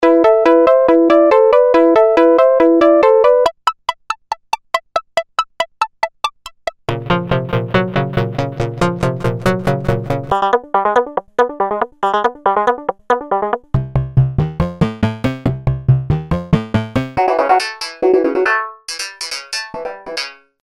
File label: Melodic Sequence, 140 bpm